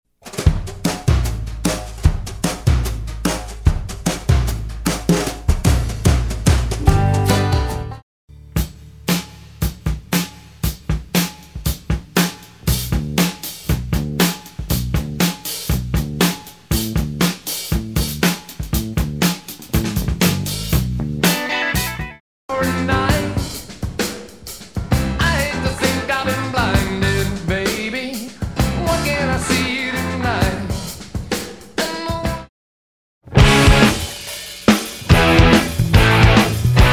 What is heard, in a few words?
Drum Reference